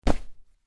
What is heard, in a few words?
Cloth Character Movement Foley Whoosh